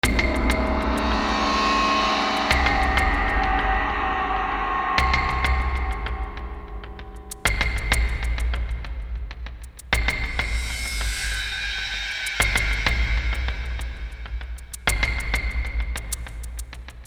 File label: Amazon Falls Ambience